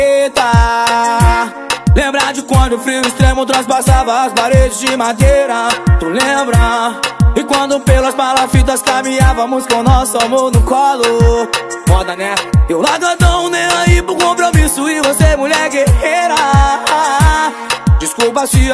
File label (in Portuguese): musica; cstrike